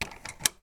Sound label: door lock; 3maze; Doors